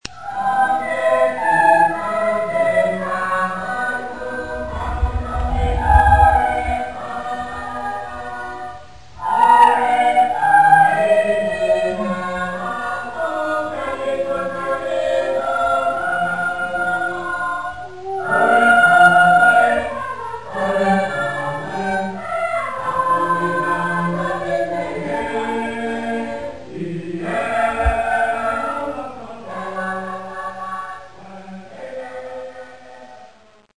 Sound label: kosrae choir small